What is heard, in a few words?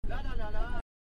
loop